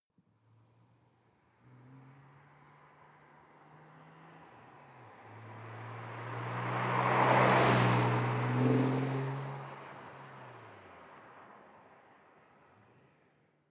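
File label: sports car exterior passing by medium speed; verkehr-transport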